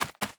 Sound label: Spider Run